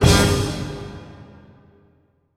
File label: HIT, orch hits; Orchestral Loops